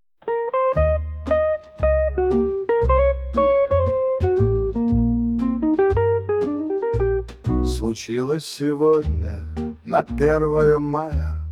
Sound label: male jazz